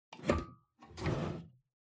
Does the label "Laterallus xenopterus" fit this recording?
No